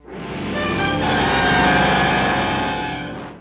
creature error

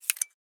BUTTON

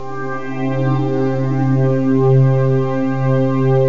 glassy night, pads n strings; Terra Sound Library; construction set

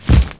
flap; Ferazel's Wand Demo

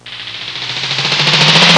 flapping; Web Clip Empire 150,000

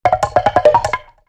one Cow; JONAH

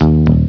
Startup Loop

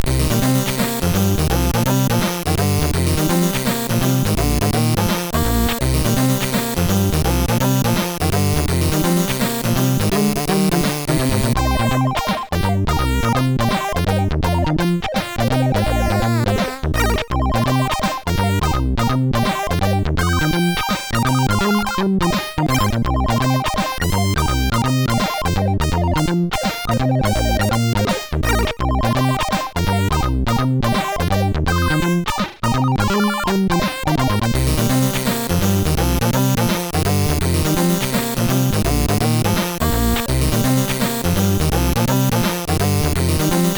atmosphere; SIDTunes

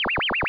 Rize Line; SketchBook 2.1

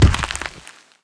hippo fall; Immortal

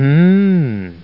hmmmmm

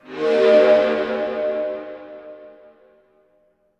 low bowed mandolin; DirectX SDK; DMusProd

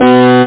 elec guitar; CDTV Lemmings

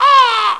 Crow; Gerbils!; QuickDraw 3D 1.5

